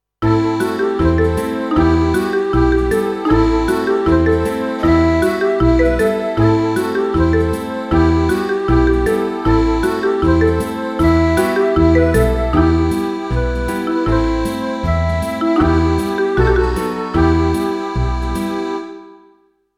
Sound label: Em Riff folk 156